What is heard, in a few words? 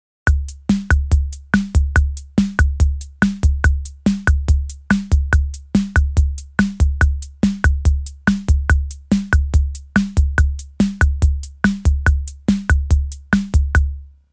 RHYTHM BOSSA-ROCK